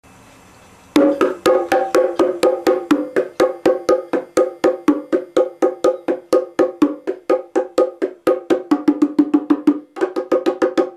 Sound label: Custom Drums bongo